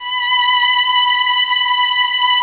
B4 hi, lush strings; InVision Interactive; Lightware VOL-1; Instruments & Percussions

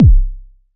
House Kick; Roland BK-5

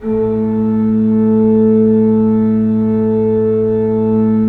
3D Pipe Mix Celeste, 3D Organs

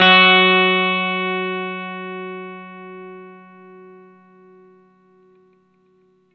NOTE FS, Vintage Blues Guitar; AKAI; USB Soundscan vol.22

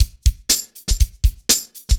120 ROCK, drums

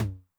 HI TOM, Noise, 909

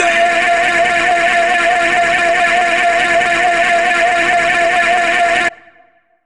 Arrrgh, Vocals; AMG - Prototype Drum & Bass

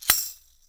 TAMSHA, EFFECT CYMB, 3D Drums; 3D Sound

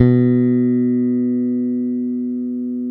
BASS B2, Electric Basses; E-MU Producer Series Vol. 8 – Platinum Phatt

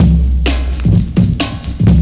MASTERGROOVE, BREAKBEATS; Crypton - DrumBase5000

